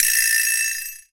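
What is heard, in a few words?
African Vibraslap; EMU PX-7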